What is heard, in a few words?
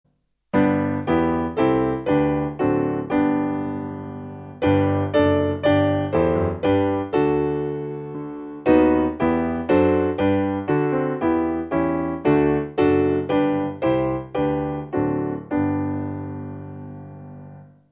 Piano; Augustine